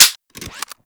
Fire Mech, Rifles; Snipetron

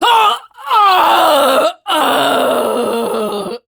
Death; Warframe; Venkra Tel; Battle

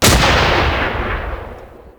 Mortar Fire, Rocket Swarm Launcher; Warframe; Eidolon Plains; Ambience; Grineer Emplacements

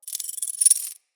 companion collar jingle; Pets; Zanuka

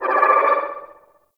Anima Calm Loop End A; Warframe; Warframes; Anima Animus

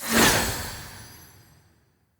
Polearm Multi Throw; Runes

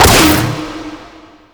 Laser Barrage; Warframe; Raptor

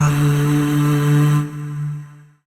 Void Shawzin Oct One D; Warframe; Zariman

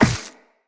Walk Ice, Footsteps; Operator

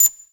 Corinth Prime Alt Fire Beep Finish, Heavy Shotgun; Warframe; Tenno

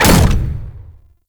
Shield Block; Vehicles; Abilities; Thano Mech; Warframe; Nechro Mech

